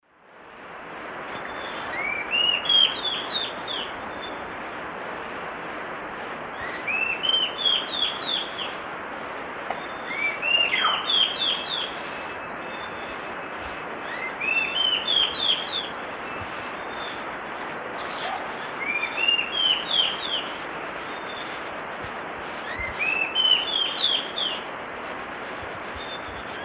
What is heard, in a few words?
Sittasomus griseicapillus, song; S. America; Eastern Lowlands